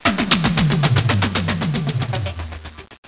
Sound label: action; Vitamin